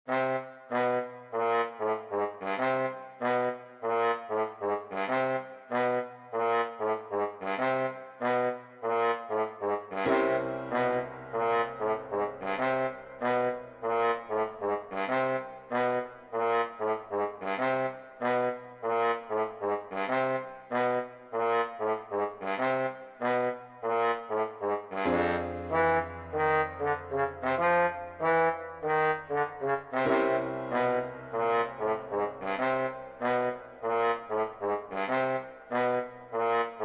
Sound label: Trombone